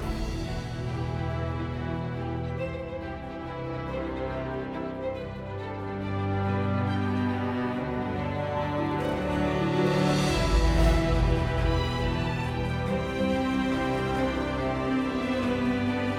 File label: loot box theme loop